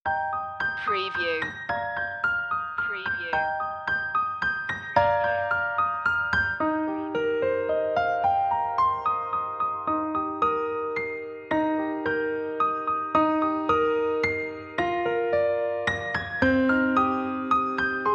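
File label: the holly and the ivy piano loop intro, Christmas Traditional; media music now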